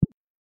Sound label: txt btn click